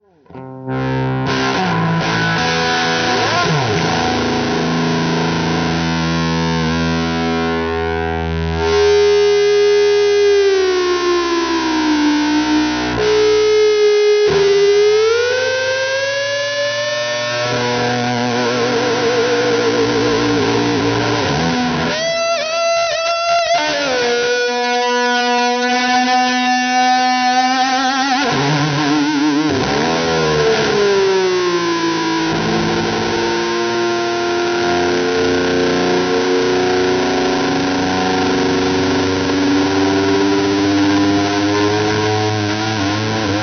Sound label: dive bomb